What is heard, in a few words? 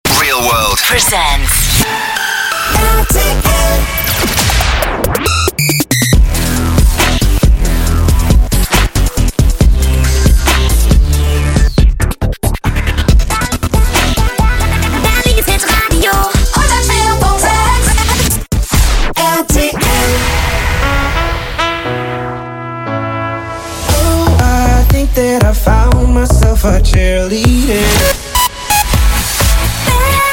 Jingles; 104.6 RTL Berlin; ReelWorld